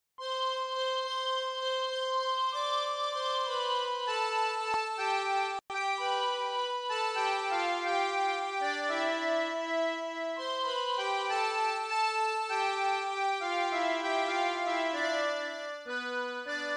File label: on piano instrumental